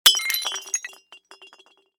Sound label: plant pot break